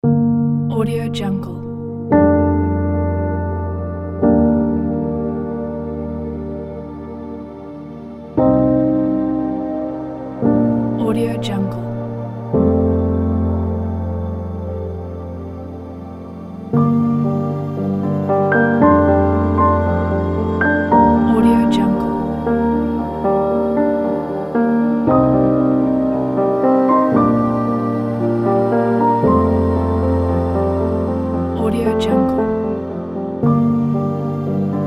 sadness piano

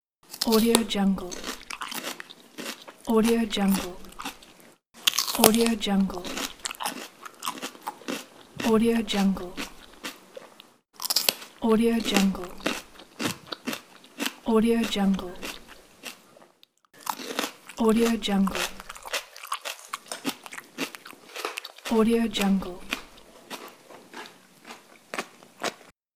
eating fruits and vegetables

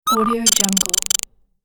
tone sensor to glitch

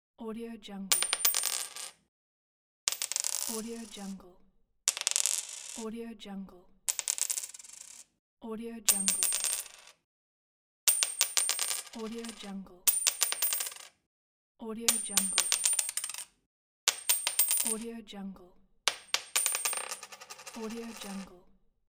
coin fall down; blackriverphonogram

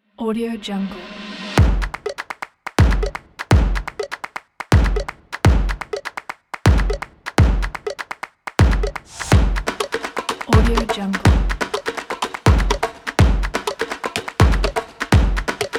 jungle drums

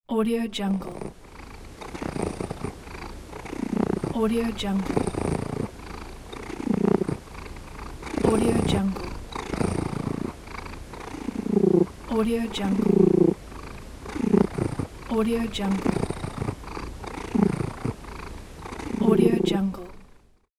cat purr; stockgold

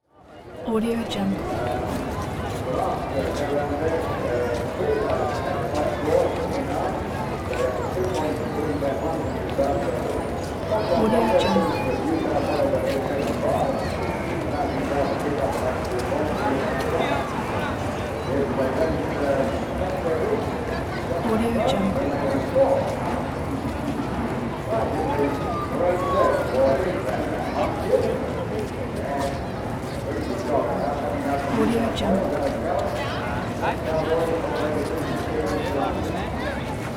street people noise

